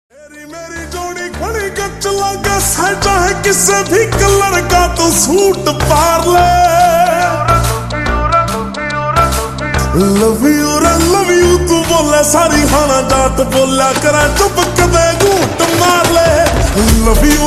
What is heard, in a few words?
Slowed Reverb Ringtone